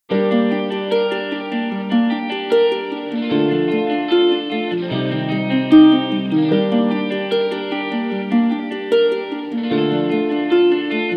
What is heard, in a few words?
dramatic guitar comp drum; By Burak Sound Bank; dramatic dram vol 15